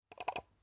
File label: Mouse Double Click